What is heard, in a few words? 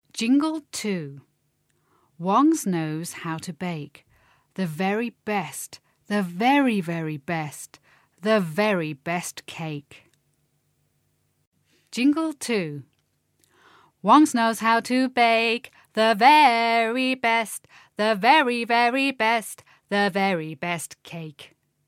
Jingle; poems and songs